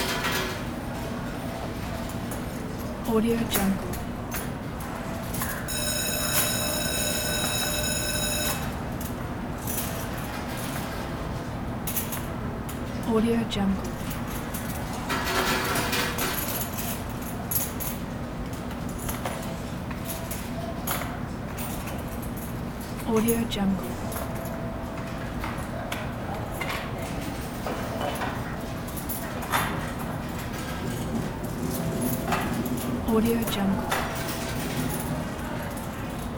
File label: las vegas casino